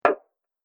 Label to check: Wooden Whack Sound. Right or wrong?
Right